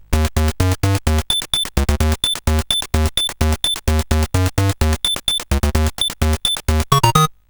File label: sid, CSG8580R5; Coco-Notes